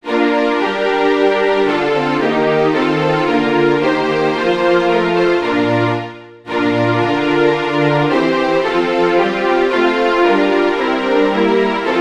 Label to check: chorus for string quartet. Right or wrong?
Right